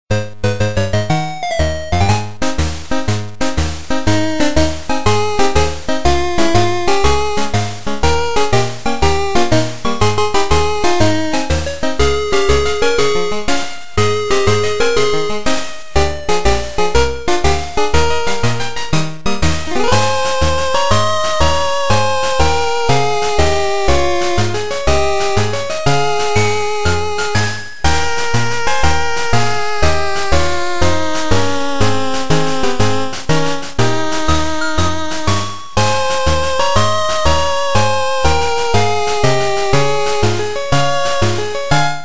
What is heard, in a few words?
(8-Bit); Safari Zone; infinitefusion